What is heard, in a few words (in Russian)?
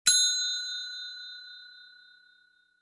kolokolchik